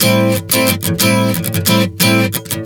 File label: Strum 090 Am, One Chord Strumming 090 bpm